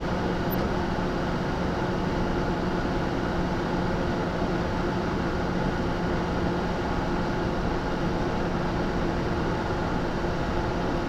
Lancia Delta Integrale, Onbrd, Fan, Cool Down, Engine Off, Engine, Left; HF 1989; Pole Position; PZM